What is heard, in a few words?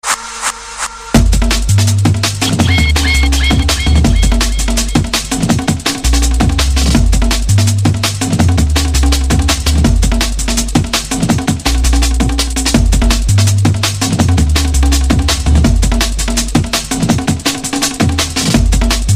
Spacey